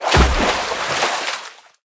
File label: Footsteps